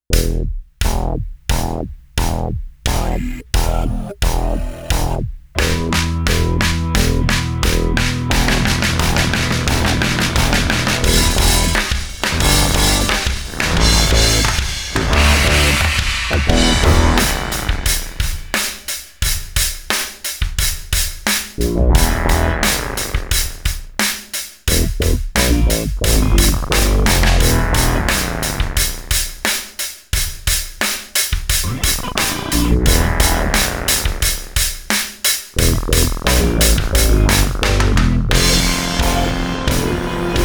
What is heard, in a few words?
Orig Bass